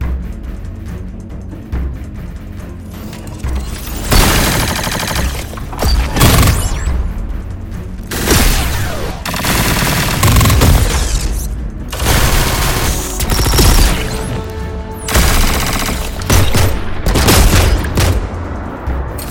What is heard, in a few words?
Sci Fi Weapons SFX; 科幻武器音效; SFXtools